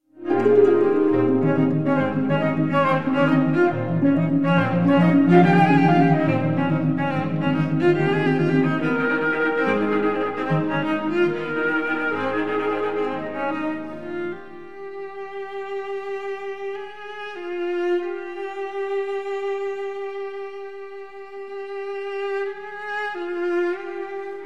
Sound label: sonata for flute viola harp 1st movement; Claude Debussy; The Nash Ensemble